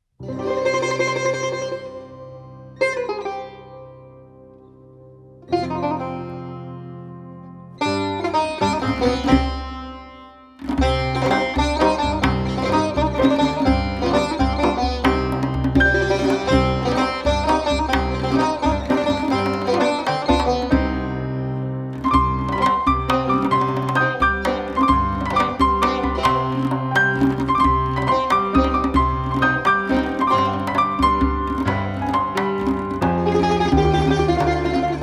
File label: Bamtar; تار باس